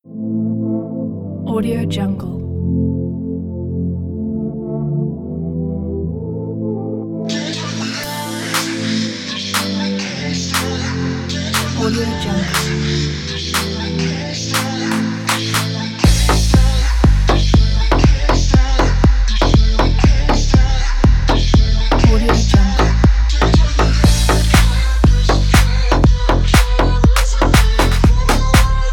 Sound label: modern tech house